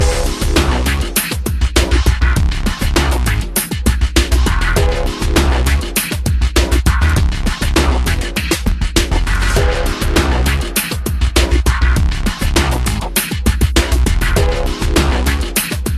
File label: Night Pool Background Music; Pixel Gun 3D